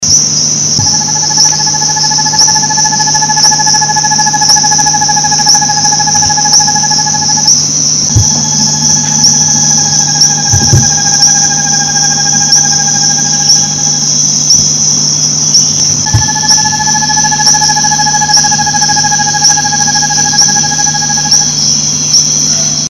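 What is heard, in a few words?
Megascops atricapilla guarau; EE Jureia; SP; Silveira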